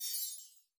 Card Pack; Rabbids Multiverse

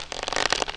Slow bow

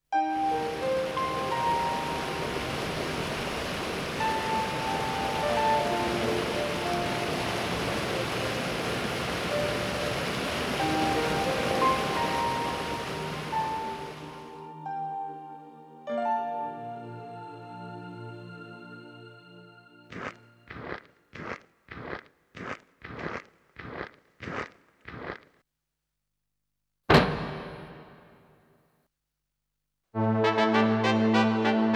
Ending

